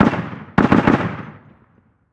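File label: gun 50cal distant; ModernWarfare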